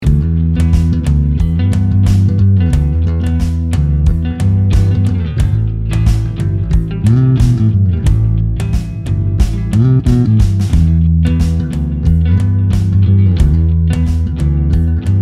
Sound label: Bass Compressor